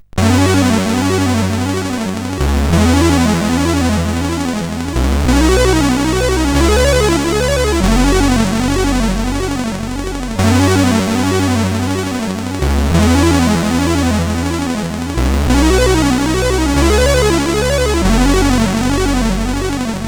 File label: CSG8580